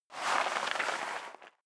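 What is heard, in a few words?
step leather shoes gravel light slide long, footsteps; schritte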